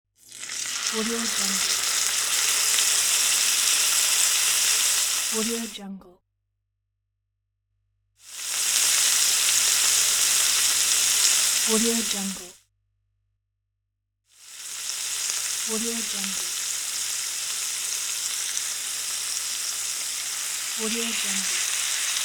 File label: sizzling meat